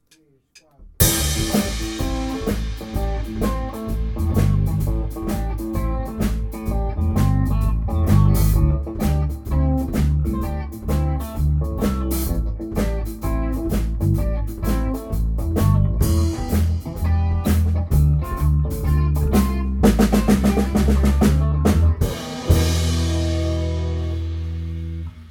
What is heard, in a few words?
Blues ending